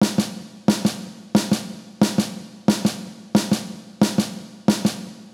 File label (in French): Rythme exo